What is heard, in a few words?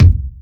KICK, Fresh Disco House; USB Soundscan vol.20 - Fresh Disco House I